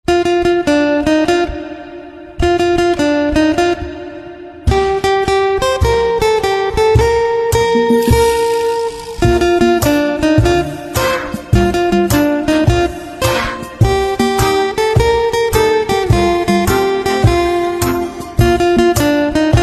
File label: Theme Music Ringtone; Squid Game